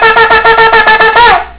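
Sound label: teruah, Shofar; parsha vayikra emor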